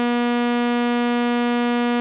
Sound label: Sawtooth; Various waves generated by the AY, ADLIB and SID; Z80